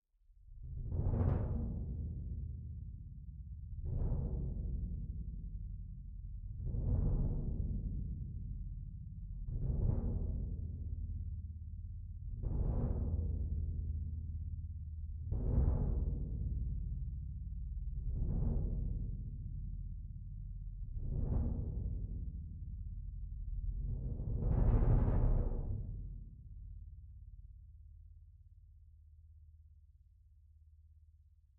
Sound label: Cassa Rear, piano